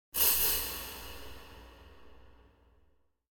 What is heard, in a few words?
Impact Thin Pointed (double) Impact; Hollywood Edge; sound designer's toolkit v2